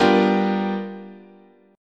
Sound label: G 7th Sharp 9th, guitar chord